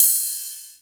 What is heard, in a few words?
Ride cymb, Roland MC-303, Cymbals; Drum Samples